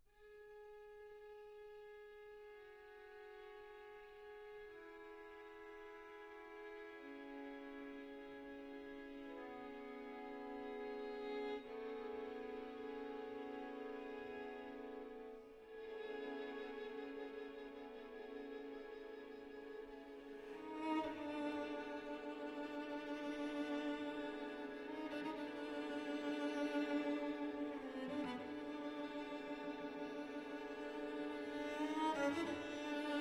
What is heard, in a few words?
String Quartet in C, Non troppo lento